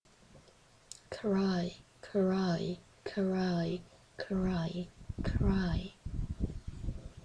cry

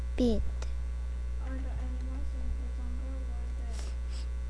beat

